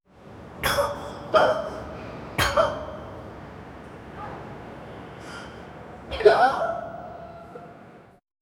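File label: gasps murmurs from passengers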